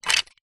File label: wood pieces thin small light pick up; foley; zapsplat